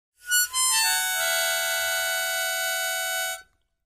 Mouth-organ, Descending Chords Whit Long End Type 2 - 7th Chord, Short And Higher; woodwinds